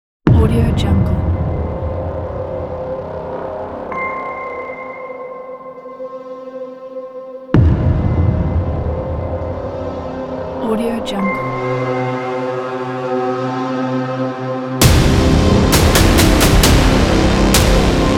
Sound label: hybrid and aggressive